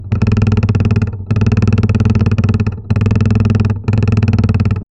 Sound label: sound effect repetitive, consistent